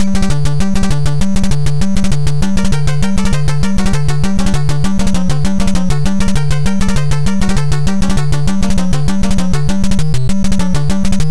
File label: tetris music; svencoop; sc tetris